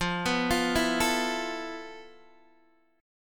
F Major 7th Flat 5th, F, down, Guitar, DADGAD

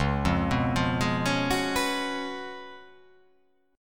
Db 11th, chord; arpeggiated; JGuitar

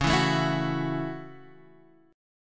F sharp Minor 7th C sharp

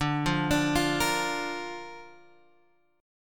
D Minor Sharp 5th D down Guitar Open G

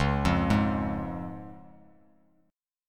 C sharp Major; arpeggiated; x,4,3,1; JGuitar

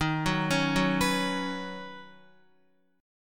Eb Minor 7th Sharp 5th Eb Guitar; arpeggiated

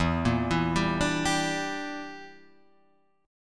E Minor 7th Flat 5th E; arpeggiated

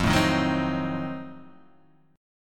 F 7th Flat 9th F down Guitar Half Step Down

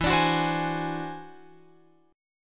E Augmented 9th E down Guitar Open F; E+9 strummed; JGuitar

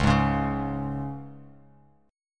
C sharp 5th, Bass Standard; strummed; C#5; JGuitar

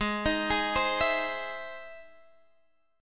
E Augmented Major 7th

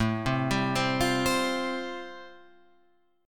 A-Minor 7th Sharp 5th, Guitar Open F, chord; 9,7,7,7,8,7; Am7#5 arpeggiated; JGuitar

